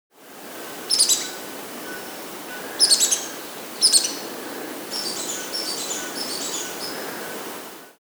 Fawn-Breasted Brillant, Heliodoxa, Nature's Songs, S. America